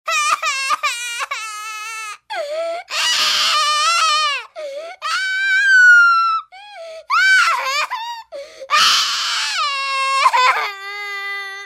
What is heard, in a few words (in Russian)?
isterika pokaznoj plach